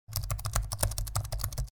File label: type laptop